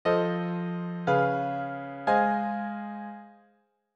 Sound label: VIII-V-I